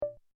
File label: button Down